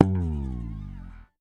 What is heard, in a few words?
FRTLSS, Bass; Sampleheads; Will Lee Bass Library; F JZZ